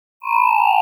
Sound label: Subtle Spin SFX; Animated Wipes